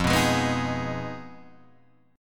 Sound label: F Minor 7th Flat 5th F down Guitar Open G